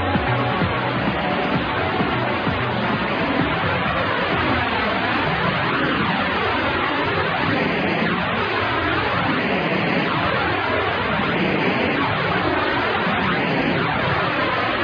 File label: plane taking off type sound effect; Dave Seaman; Shelleys November 1991